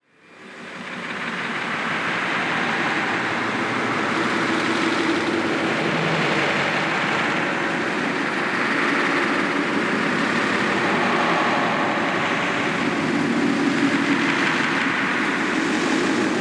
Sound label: train freight; Rbh